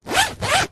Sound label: tent bag zipper fast